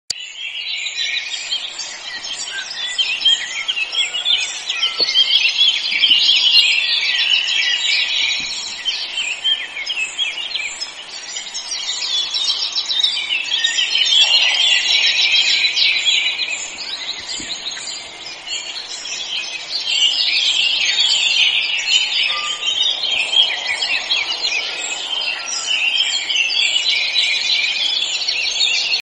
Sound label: cool morning birds singing musical tone